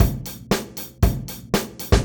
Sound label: 117bpm; GroovePizza